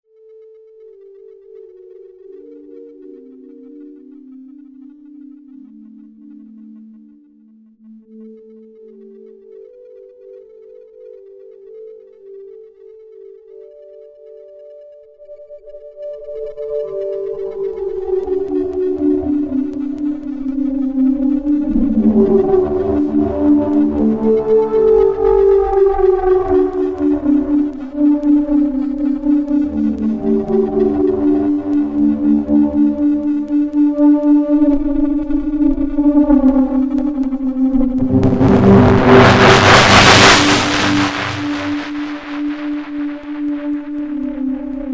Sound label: Desolate Landscape